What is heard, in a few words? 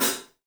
3D Drums, TIGHT ZILDJ; 3D Sound